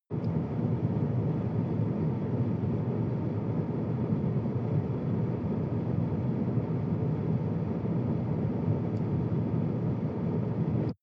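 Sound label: sports car interior ventilation step 9-15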